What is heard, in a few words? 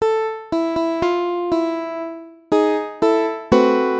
outro; アウトロ（エンディング）